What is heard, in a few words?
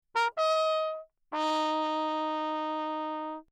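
trumpet, without mute, signal; Bach; ohne; Perinétventiltrompete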